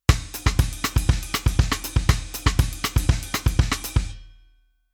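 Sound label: Rhythm Bossa Pattern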